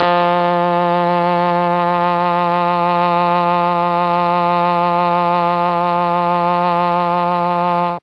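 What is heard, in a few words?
F4 trombone trumpet, mellotron; TROMB+TRMPT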